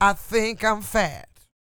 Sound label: SING, Vocal Planet Blues Soul BLUESY